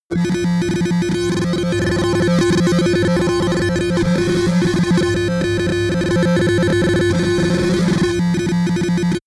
digital error low, sciene fiction

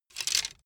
bamboo sticks; objekte; holz

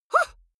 female fight huh short; vocals; comedy-cartoon; soundpool

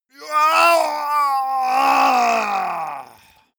male die gurgling; soundpool

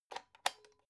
electric whisk insert whisk; haus-garten; kueche

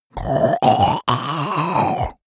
monster choking zombie; koerpergeraeusche; comedy-cartoon; soundpool

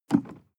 sports car int pedal gas step and release hard; autos; verkehr transport

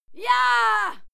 female cheer yah short

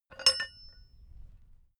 metal bolt ring

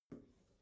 bathroom cabinet open soft smooth; objekte; tueren

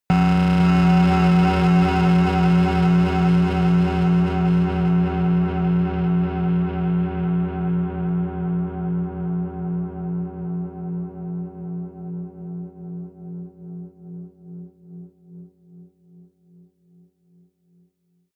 stinger hum, drones; musikelemente

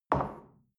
shell place on table soft short; berufe; gastronomie; soundpool

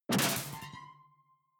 sci-fi alien console hard; maschinen; signale; soundpool

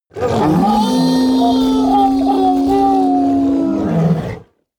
hellhound howl long; kreaturen

